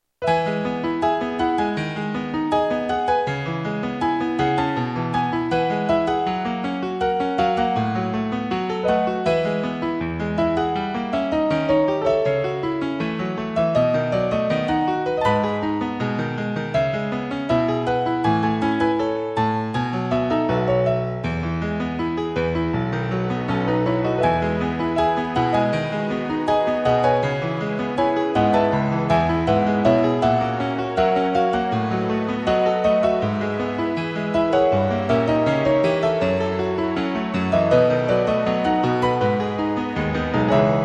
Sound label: piano